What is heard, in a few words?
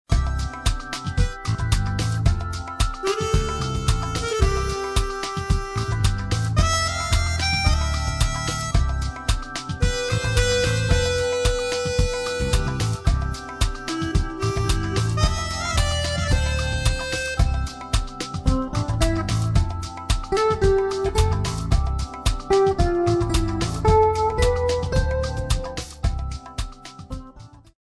light jazz